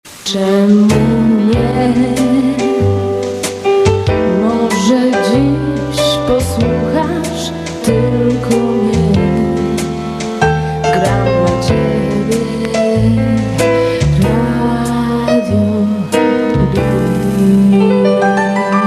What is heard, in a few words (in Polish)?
jingiel